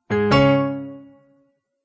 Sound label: multimedia tone advance